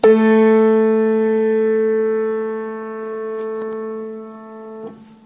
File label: Sangler A3 A4 median beatless just octave